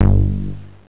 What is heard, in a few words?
bass; Angelic Layer